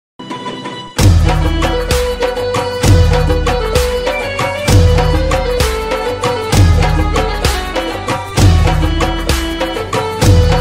garba ras instrumental ringtone gujarati garba music; calling ringtone